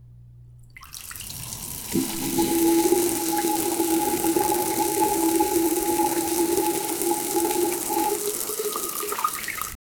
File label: realistic sound of water, sink